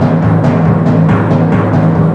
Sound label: gyro layer; weapons; dota; pangolier